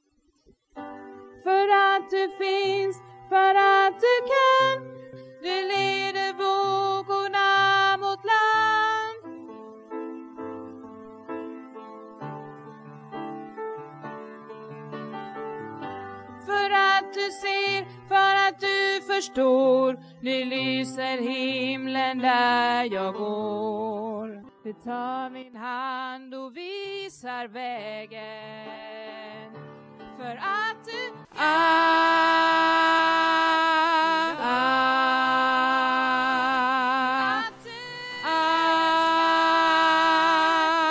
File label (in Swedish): bas, stammor